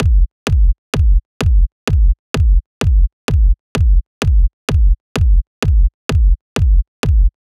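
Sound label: Drum Loop 128BPM Solo bass drum; VEC5 Drumloops; VEC5 Multi Loops; Loop Elements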